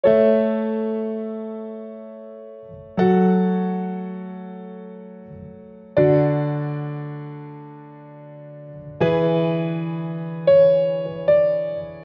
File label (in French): piano seule